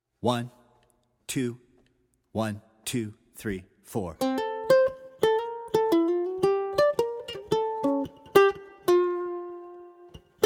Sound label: confirmation, uke